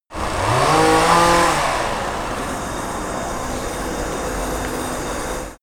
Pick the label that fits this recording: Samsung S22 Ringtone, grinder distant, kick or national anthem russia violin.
grinder distant